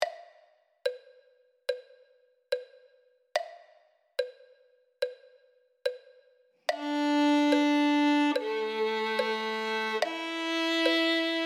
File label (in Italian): violino